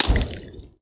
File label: molly splode, molotov; gmod